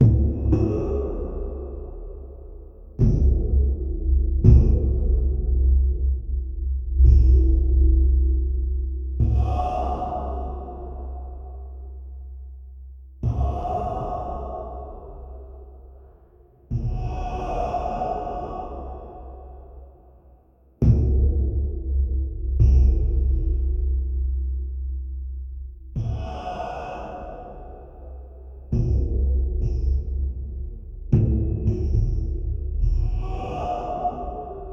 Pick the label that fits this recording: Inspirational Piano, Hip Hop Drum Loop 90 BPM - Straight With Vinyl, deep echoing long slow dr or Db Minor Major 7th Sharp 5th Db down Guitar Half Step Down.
deep echoing long slow dr